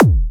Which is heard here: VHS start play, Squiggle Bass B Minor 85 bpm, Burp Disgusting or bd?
bd